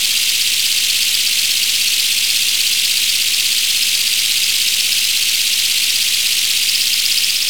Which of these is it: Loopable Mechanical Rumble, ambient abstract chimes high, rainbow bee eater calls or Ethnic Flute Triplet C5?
Loopable Mechanical Rumble